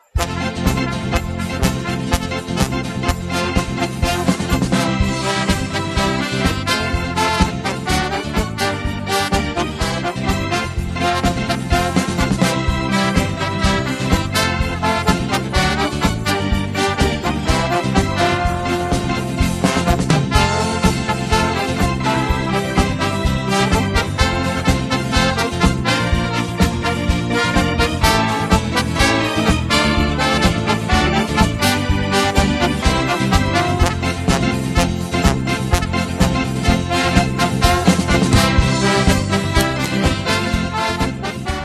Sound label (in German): Original Hohner Dorfmusikanten Banda